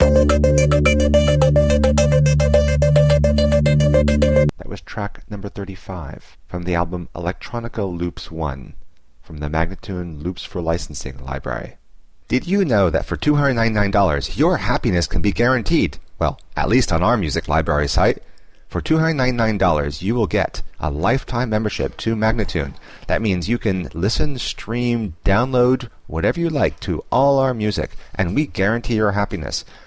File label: 107 D spoken; Electronica Loops 1